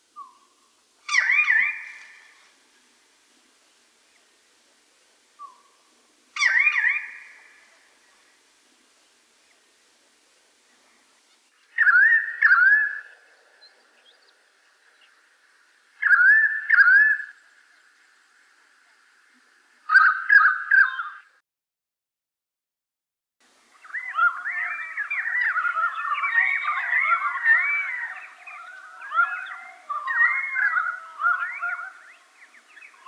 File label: pied currawong